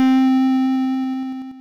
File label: synth, amiga med